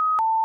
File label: rem gun disconnect; Original TK Sounds